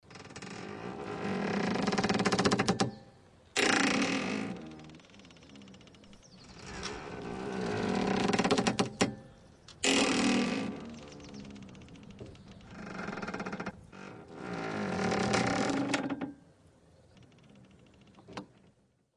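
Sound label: Cable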